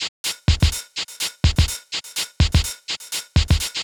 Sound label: DEEPHOUSE BEATS 125